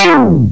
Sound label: riff slide, techno; Terra Sound Library